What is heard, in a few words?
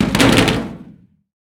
pistol drop metal thin; STALKER; IWP